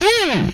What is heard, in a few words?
Hit, Vocal; MMRetroArcadeSoundsPack